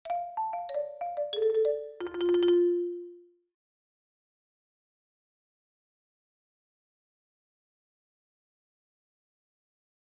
Marimba Melody Theme B; Factory

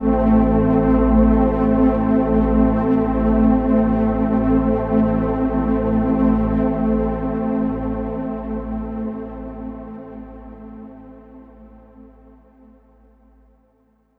Overlay Drone; Act2 Rezoning

